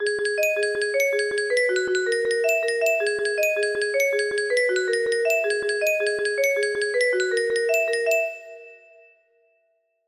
music box

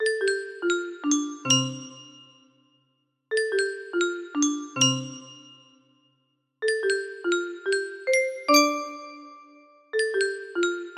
track 2 piano

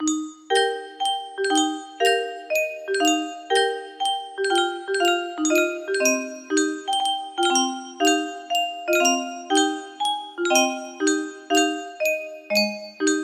ballad piano strings

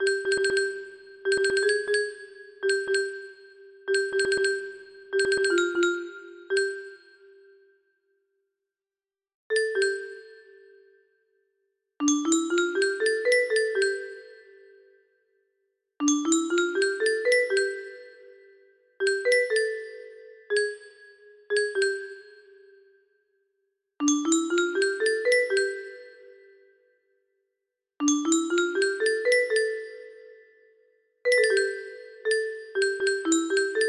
sax

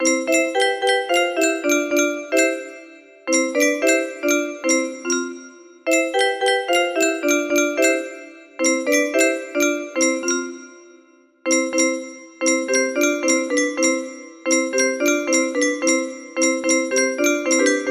music box, c major

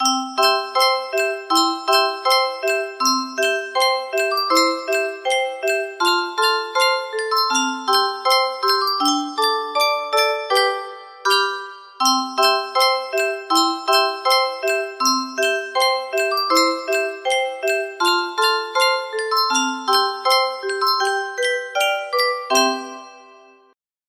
20 note music box

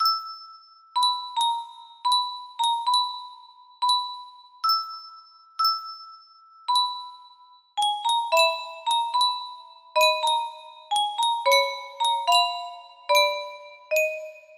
piano duet

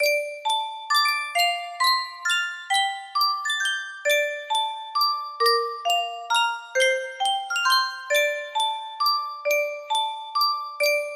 music box

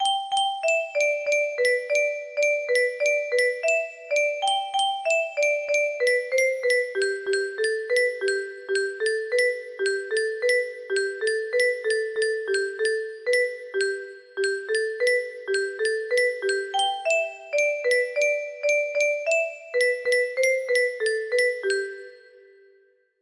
music box

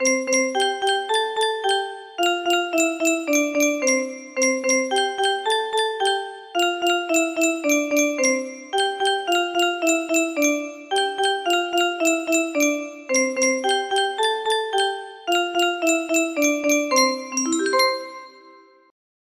music box; turkiye

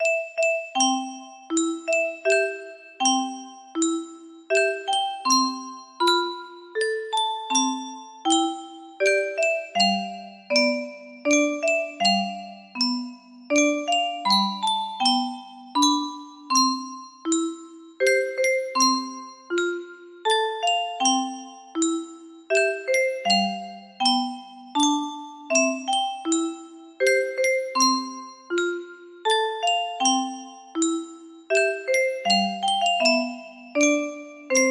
lullaby music box

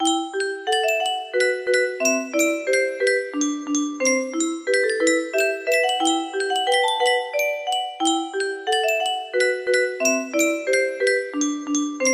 music box

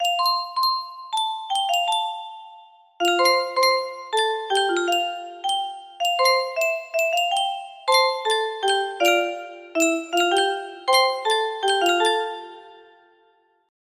music box

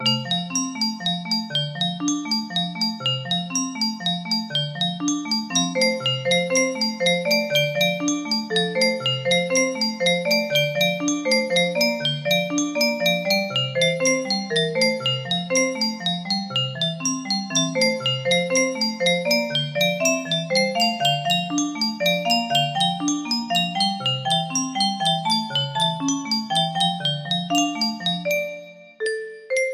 celesta

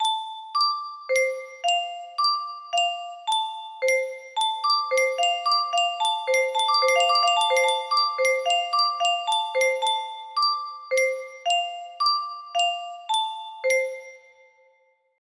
8 notes melody

